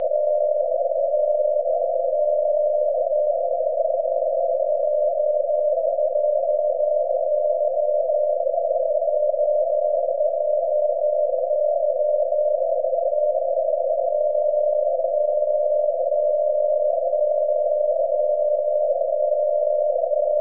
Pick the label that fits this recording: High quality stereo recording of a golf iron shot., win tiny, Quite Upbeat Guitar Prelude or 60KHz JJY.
60KHz JJY